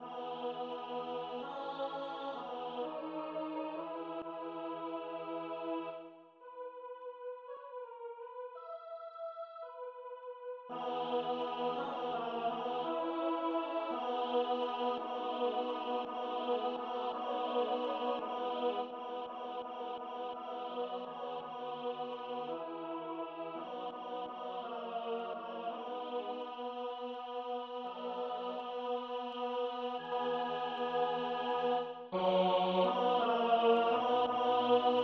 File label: Tenor; F.Mendelssohn; Fruhlingsahnung